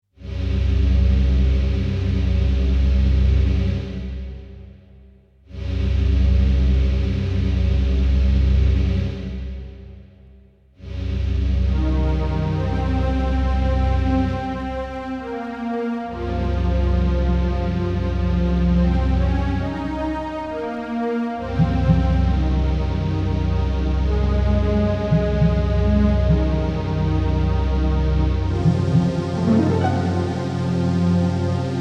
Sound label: dungeon (doll house)